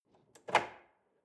drawer large lock open

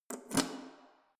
letterbox metal insert key hard

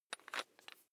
electric drill bit fasten wrench turn fast

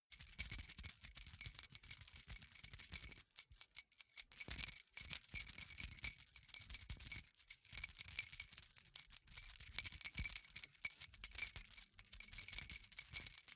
key ring small rattle air sequence

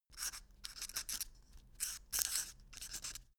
quill write

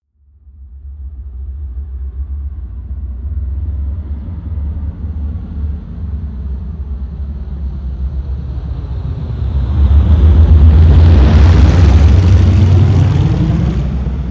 air strike

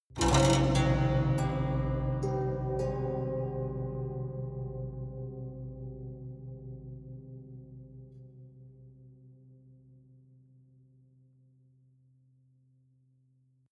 haunted strings dark sequence

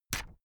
notepad sheet tear off fast

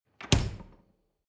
refrigerator door slam

